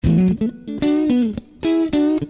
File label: guitar sample